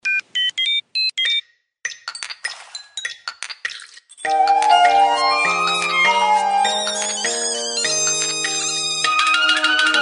new pakistani anthem ringtone

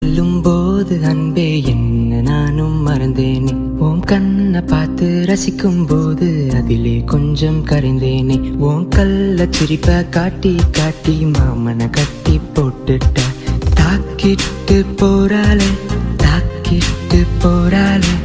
tamil ringtone

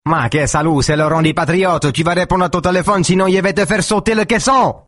italian mafia tone ringtone

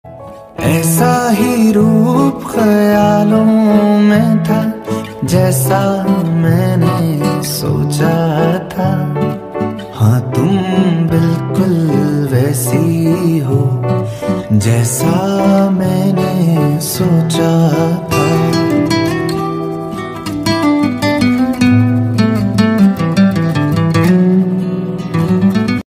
unplugged ringtone

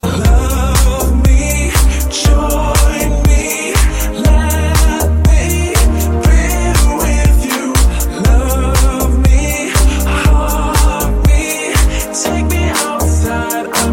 love me nokia ringtone

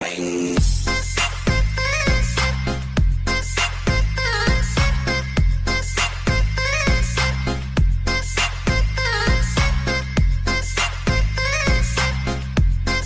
trap music ringtone

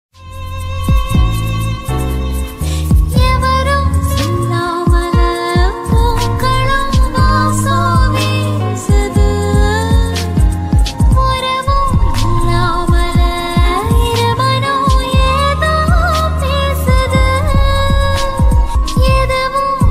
tamil ringtone

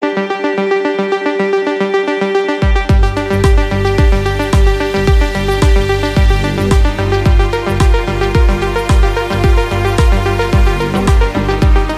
iphone 11 ringtone new mix

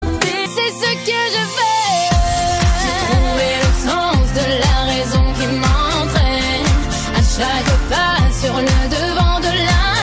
french ringtone

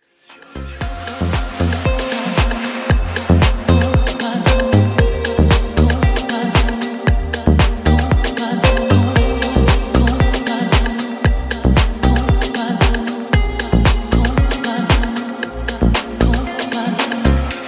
best instrumental ringtone

